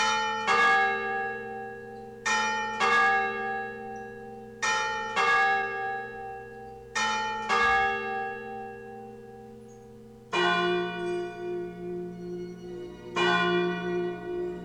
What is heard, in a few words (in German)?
Turmuhr 4 vor 7 Uhr